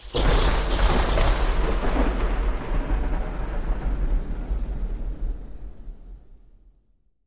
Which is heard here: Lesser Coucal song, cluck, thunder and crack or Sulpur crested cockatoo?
thunder and crack